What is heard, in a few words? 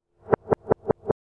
Door knock sound effect